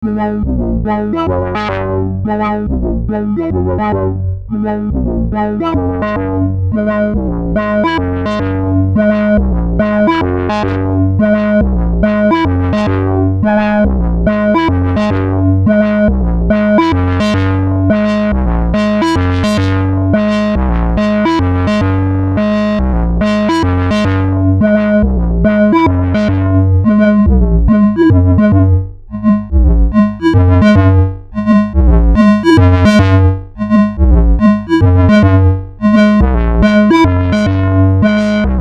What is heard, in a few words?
seq FM filter, SH7